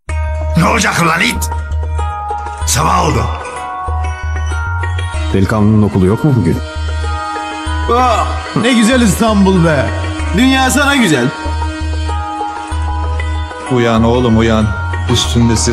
alarm; kurtlar vadisi

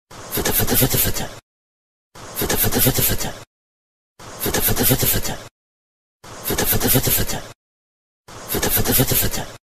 fiti fiti ses efekti

